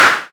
hand clap f key; percussion; single hits one shots

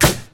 smooth snare drum sample g key

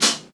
thin hat sound sample D key; hits one shots; hat samples

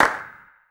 small reverb hand clap sample g sharp key; percussion; single hits one shots

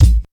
studio kick single hit a key; kick drum samples

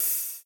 short open hat one shot f key

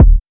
original kick D sharp key; kick drum; one shot

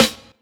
focused snare sound A key

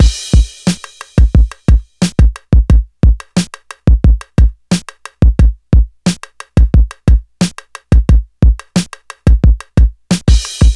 89 bpm drum beat A key; drum loop

